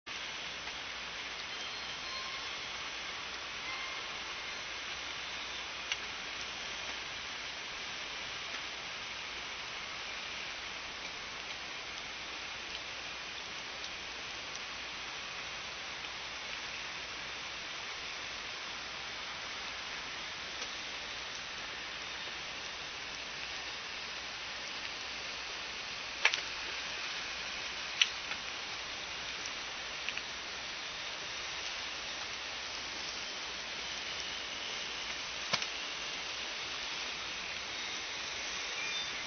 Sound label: calls clear boat noise